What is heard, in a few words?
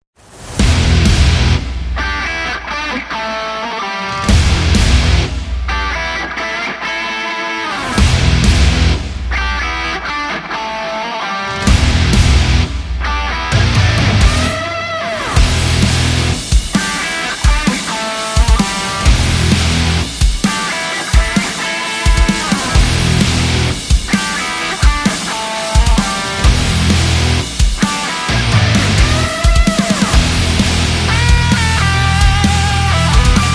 In Hard Rock